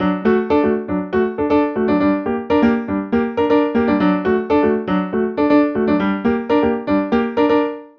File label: Piano; Dr. Fink's Funk Factory